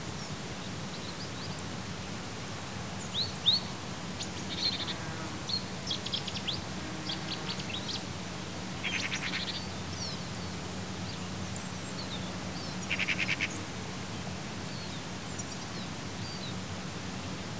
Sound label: Birds And Insects In The Wetlands Of Melissant